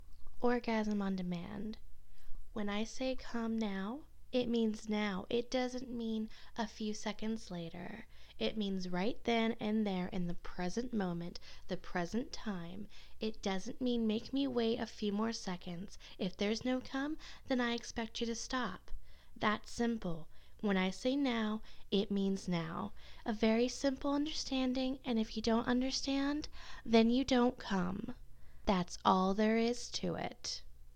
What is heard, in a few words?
Orgasm On Demand